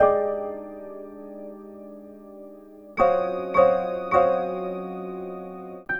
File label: dancepack ac piano